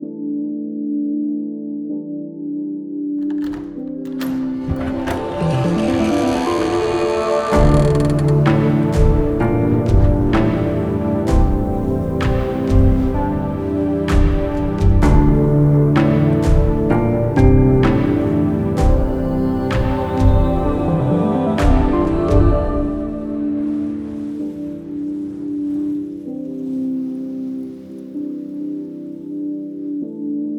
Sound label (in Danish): Jingle; Kort udgave